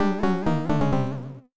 GameOver; Jingles; TowerDefenseGame

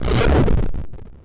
Fire; Elemental

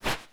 Whoosh; Slash; TowerDefenseGame

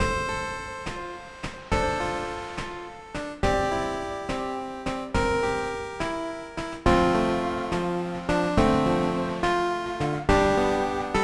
Chill; Musics; TowerDefenseGame

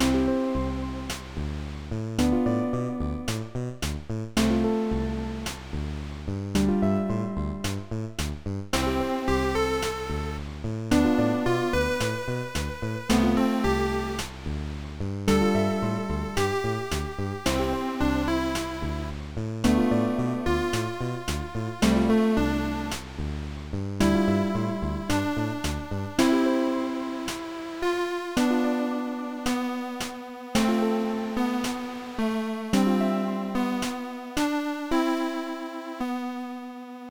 Aquatic; Musics; TowerDefenseGame

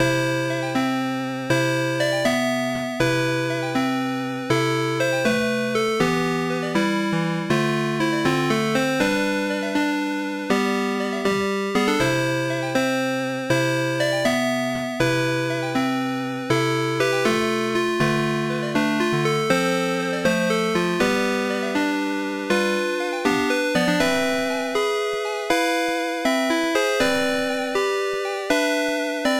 Calm Village; Musics; TowerDefenseGame

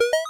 Move, Menu; TowerDefenseGame

